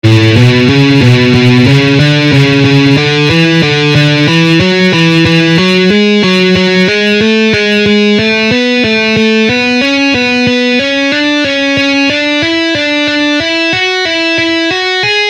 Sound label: Guitar scale exercises